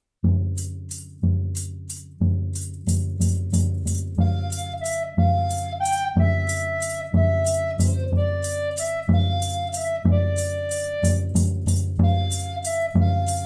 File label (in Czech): Dvojhlasý tanec (2. hl + bicí, tempo 185); anonym : Dvojhlasý tanec; Flautoškola 2 – METODICKÉ NAHRÁVKY a DOPROVODY